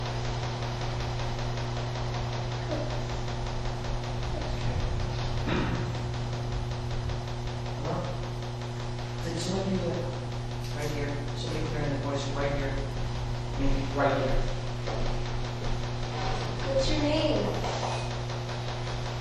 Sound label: voices + throat clear